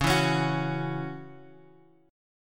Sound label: Db Minor Double Flat 5th, Guitar, Open F; 13,11,13,13,x,x; strummed